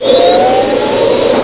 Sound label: Jet Startup